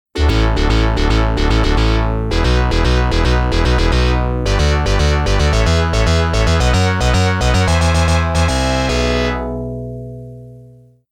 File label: Stage Boss Picture Demo; Gun Smoke